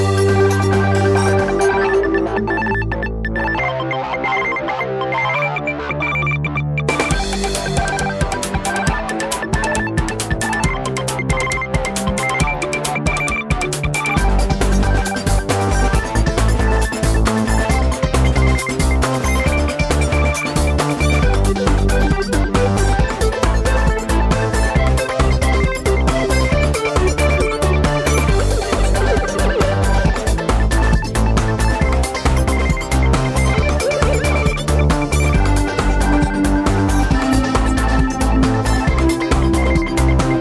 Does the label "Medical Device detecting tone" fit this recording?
No